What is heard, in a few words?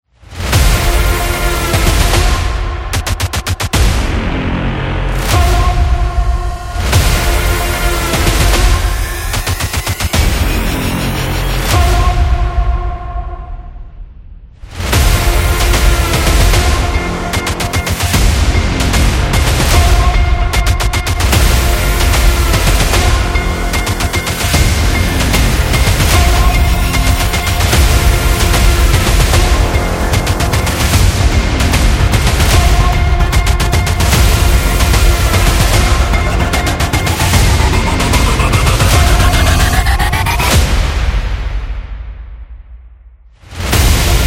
Hybrid Epic Trailer